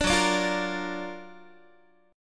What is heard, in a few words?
Gb Augmented Major 7th Gb down Guitar 4A,4E,5A,5D,5G sharp,6C sharp; Listen to Gb+M7 strummed